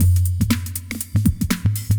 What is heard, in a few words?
combo loop, 120